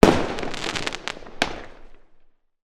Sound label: firework explosion fizz; sound effects one